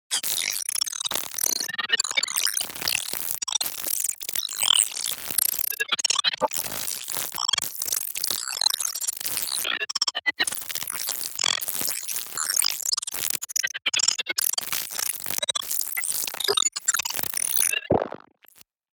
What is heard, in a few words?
Futuristic text generation long